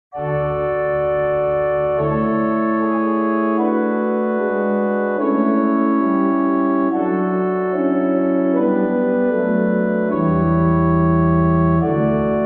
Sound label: cadences; Bach, J.S., Chorale 46, Vom Himmel hoch, da kom'ich her, mm.1-2 (viio6/5 to I)